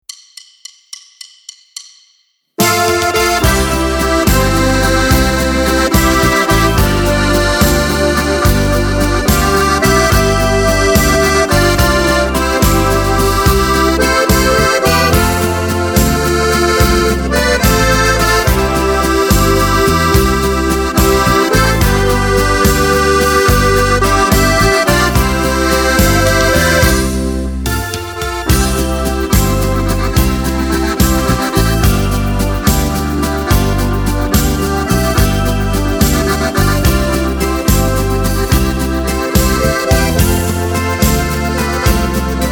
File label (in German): Karaoke ohne Chor